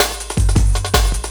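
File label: LOOP snare drum, Drum & Bass, 160 BPM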